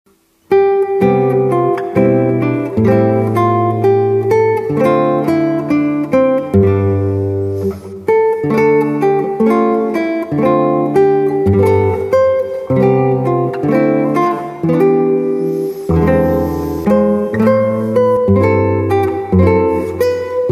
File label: guitar